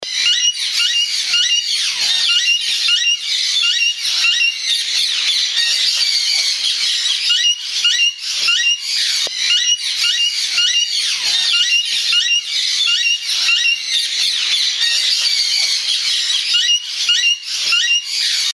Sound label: Alexandrine Parakeet, Parrot Calls